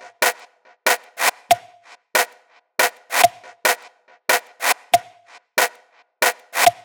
Top Loop 140 BPM, psytrance; Top Loops

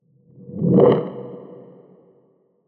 Wham, whooshes

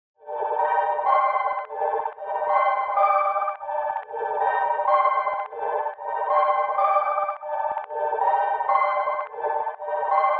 126 BPM G Min Pitched Chords; Melody; Loop Stems; Cymatics; 2022 Melody Collection Platinum Expansion; Go Faster

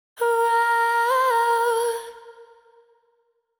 Euphoria Ad Lib Wet - 140 BPM G Min; Cymatics; Vocal Sample Pack; Ad Libs

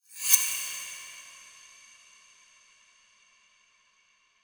Stress

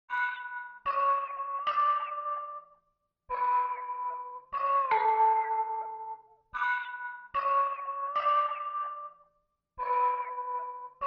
148 BPM B Min Mallet; Loop Stems; Cymatics; Veil

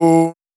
Dry One Shot - E, Tonal Vocal; Cymatics; Euphoria Vocal Sample Pack

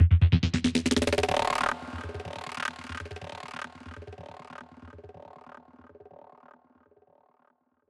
Gated E 140 BPM, Psytrance; Digi Box; Gated Mistral

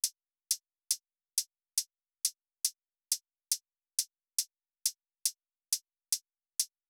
Drum Loop, 138 BPM Hihat; Loop Stems; Cymatics; Vibes II Drum Loop Collection; Rings

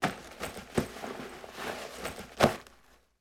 Backpack Movement; Fairlight Sound Lib

